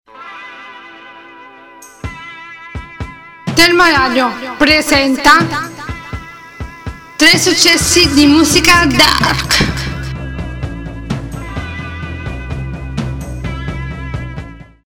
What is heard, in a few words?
dark, jingle; successi